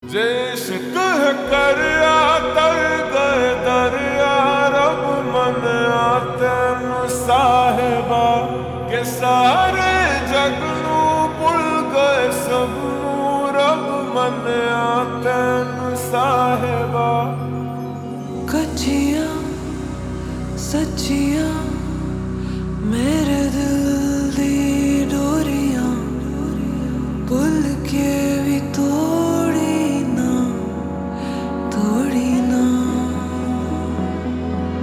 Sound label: Slow Reverb Version Ringtone